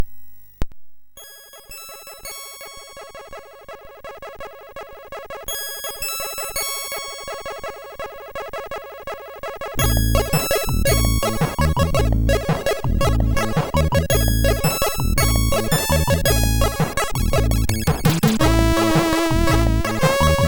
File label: Forest Adventure, MOS6581R4